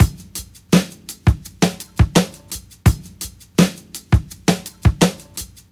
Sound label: 84 bpm drum groove g sharp key